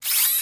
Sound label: Pinky servo; DoomRTX